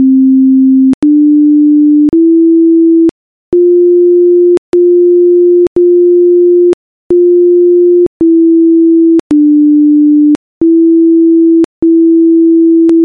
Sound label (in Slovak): Stupnica pesnička